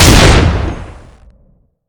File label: shotty; balrog